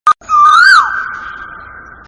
koyal bird sound

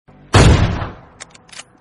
awm sound; pubg

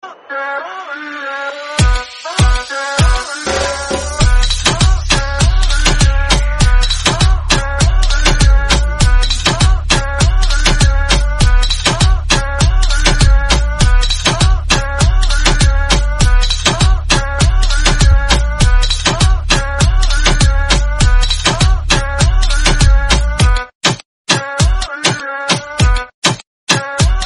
Instrumental

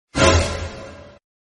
funny sound effect

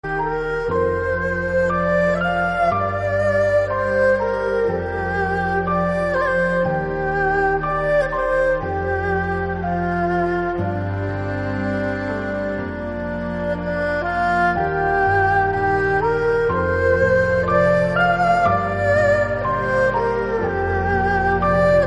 erhu cover; inuyasha

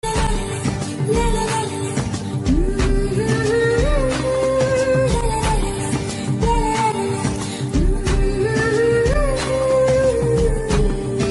bgm romantic

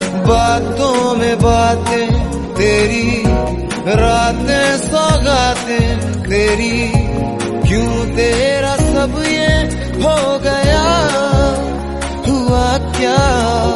hindi song; jab we met

